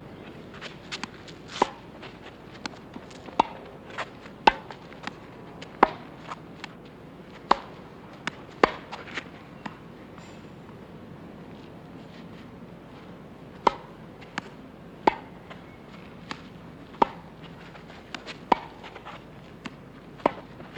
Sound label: Tennis